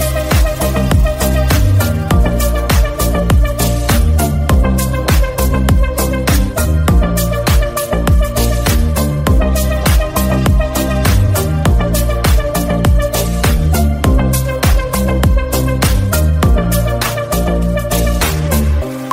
zang mobile

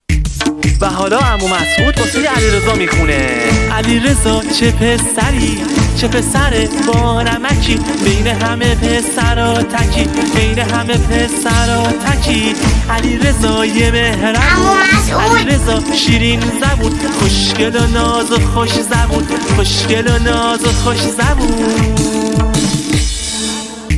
fun music

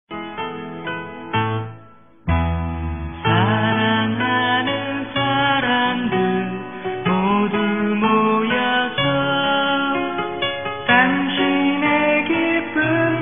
happy birthday korea

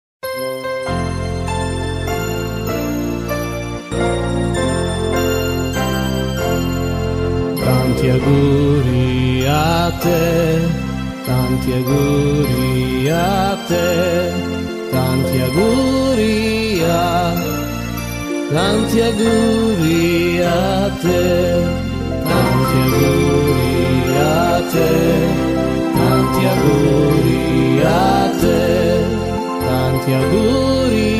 happy birthday to you

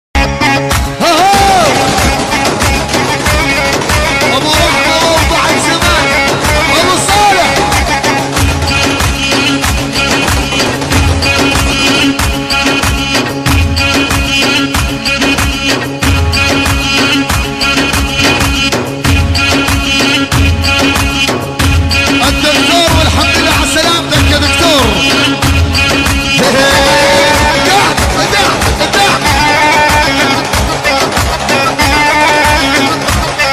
arabi shoti polici

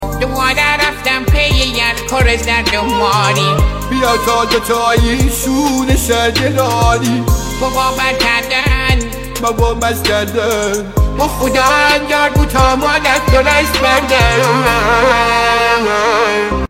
fun music

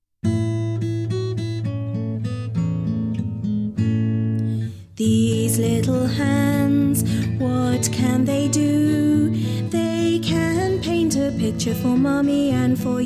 baby music

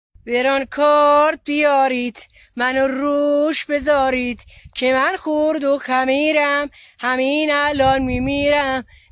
fun music

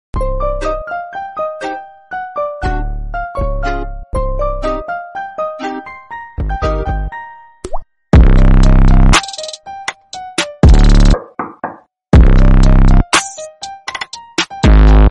halloween base song

